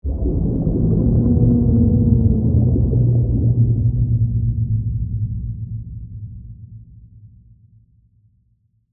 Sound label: Sea Creature Move, Horror, Moan, Whale, Song; Monster Sound Effects; SoundEffects-BlastWave